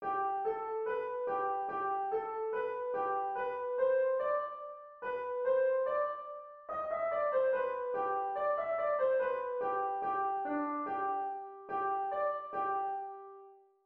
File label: error detection